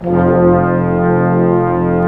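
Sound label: brass section, large ensemble long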